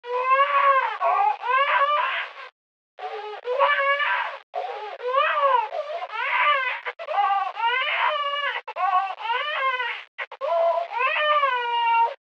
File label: Brainwashing Signal; Lrad Brainwashing Signal7